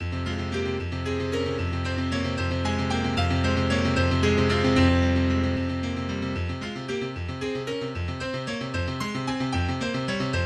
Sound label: Etude in F Minor Allegro molto agitato